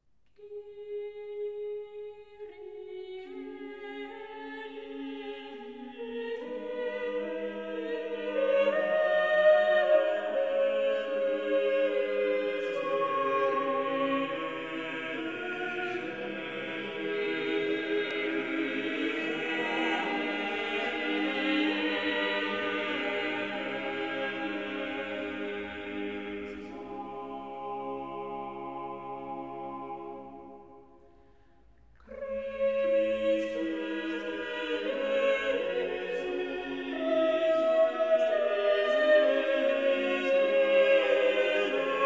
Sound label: Mass For Five Voices Kyrie